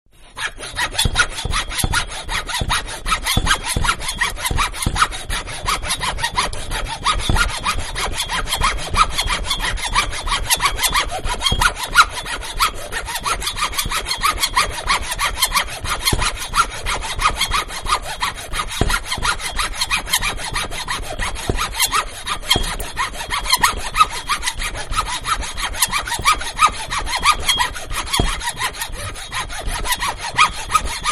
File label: Toothed Saw Small Sawing Wood; Machines